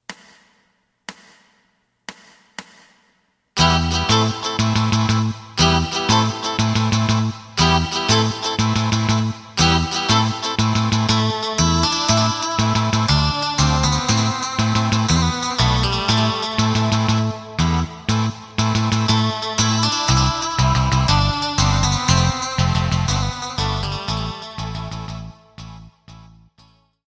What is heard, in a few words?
drum; エレキバンド用カラオケ